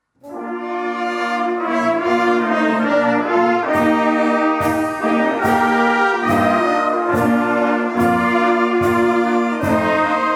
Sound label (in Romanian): Fanfara